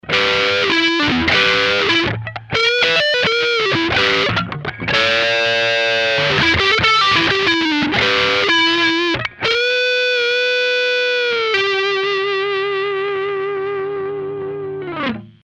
hot slag neck dirty

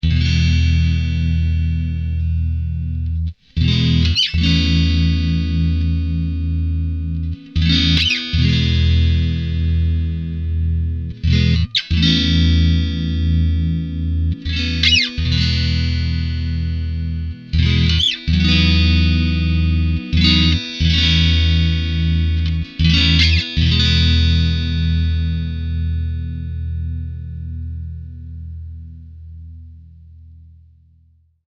pig iron clean neck

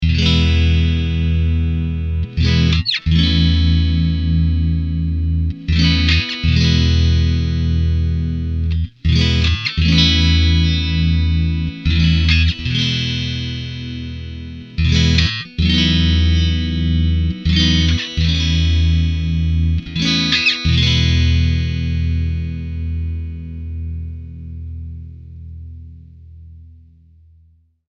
pig iron clean middle

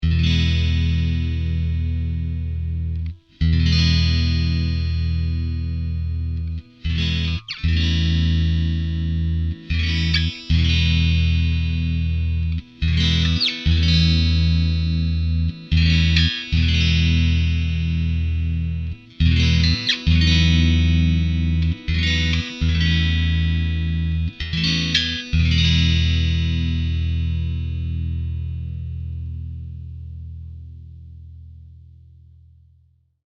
clean middle mono; pig iron

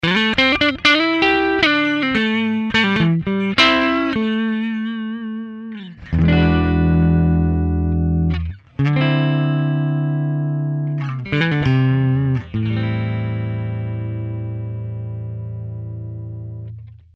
neck clean; hot slag

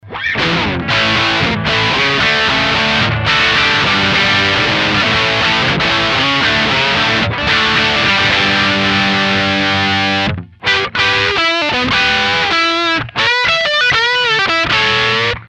steam hammer bridge dirty

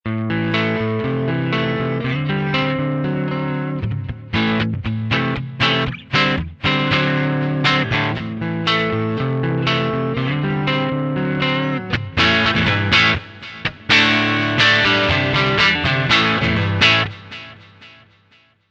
neck clean; rolling mill2

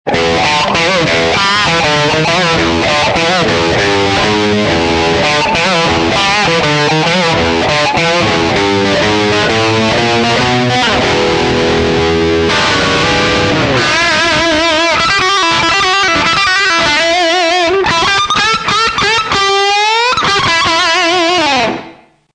bridge dirty; blues engine

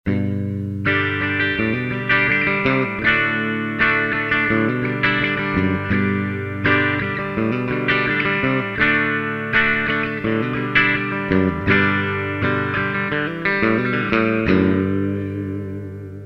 bridge clean; tesla shark

